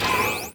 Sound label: small charge